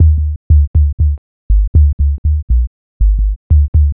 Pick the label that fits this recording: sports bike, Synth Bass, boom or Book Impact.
Synth Bass